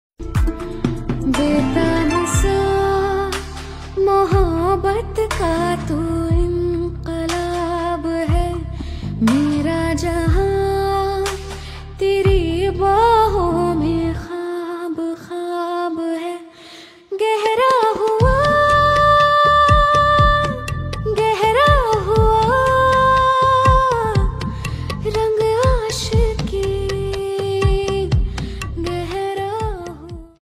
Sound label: ringtone female version